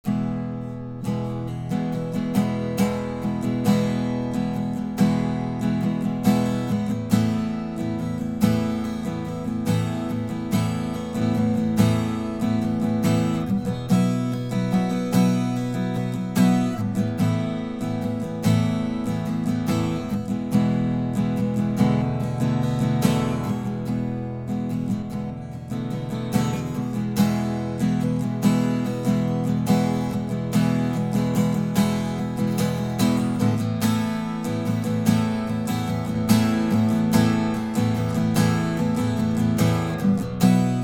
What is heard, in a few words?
tube out in; audio examples from the book